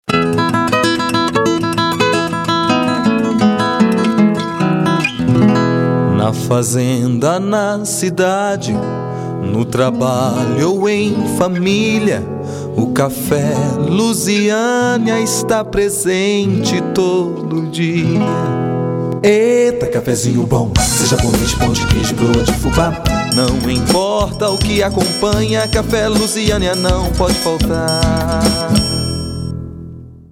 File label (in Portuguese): Jingle cafe; luziania